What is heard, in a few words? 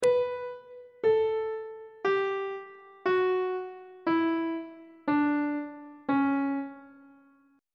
d flat locrian mode descending on treble clef